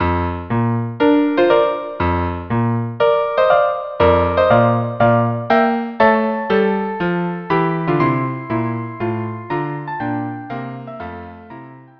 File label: March trio; Fidelio